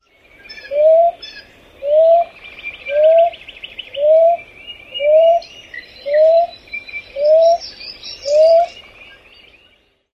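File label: Common Ground Dove song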